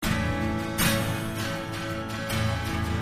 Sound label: Strummed A 83